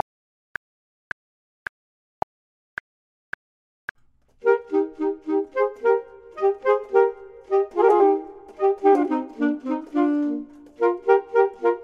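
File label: both, Saxophone and Clarinet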